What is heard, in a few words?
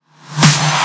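Big Snare with Pre Sweep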